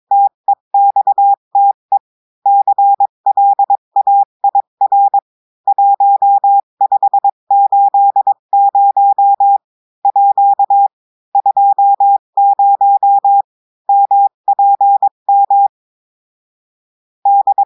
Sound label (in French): cours texte clair 20 mpm; texte en clair; vitesse20